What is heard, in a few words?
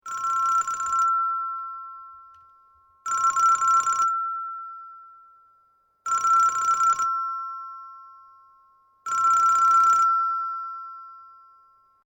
TELEPHONE PUSHBUTTON OLD RING LOOP; Old style telephone; Telephones; Electronics